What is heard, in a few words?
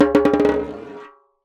Jembe Bounce; Percussion Samples